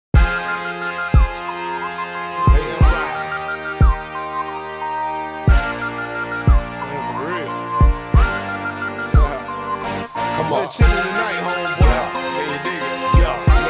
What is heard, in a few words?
rap